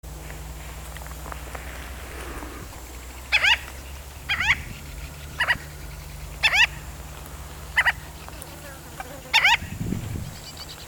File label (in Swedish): sandtarna